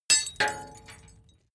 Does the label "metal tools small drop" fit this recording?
Yes